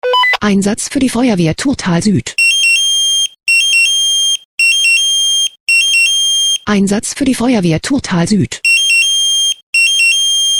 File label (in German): SMS Alarmierungstone; FW Thurtal